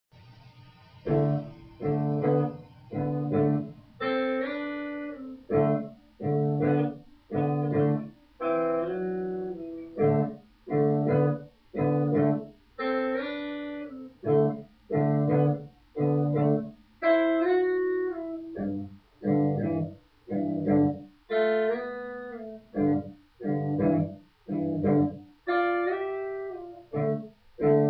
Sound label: Rhythm with Fills, guitar